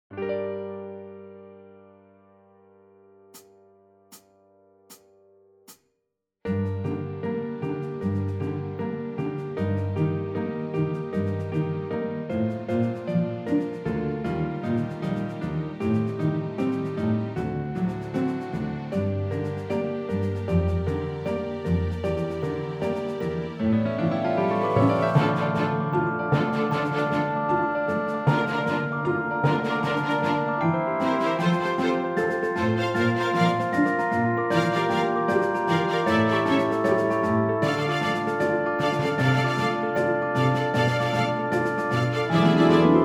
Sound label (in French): accompagnement